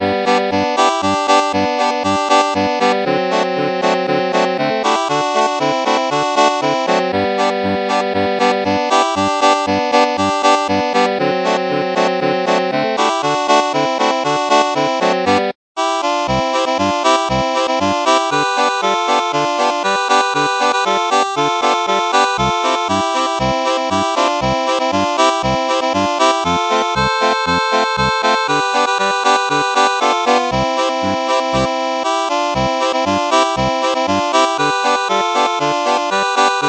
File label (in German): Polka, steirische Harmonika